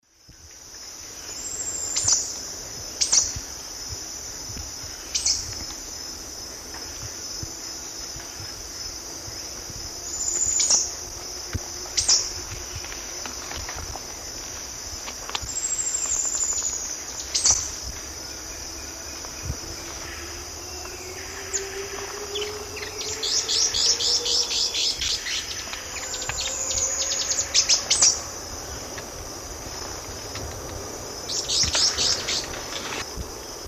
Epinecrophylla fjeldsaai, group calls, S. America Eastern Lowlands